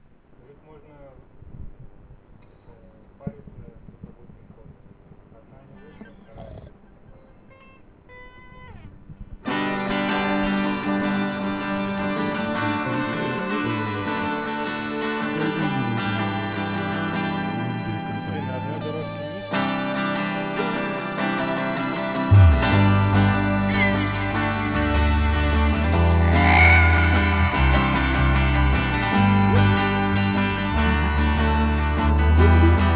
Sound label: bass track added